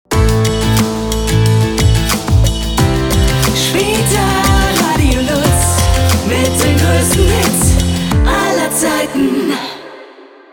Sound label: 90 BPM JINGLE; Spitalradio LuZ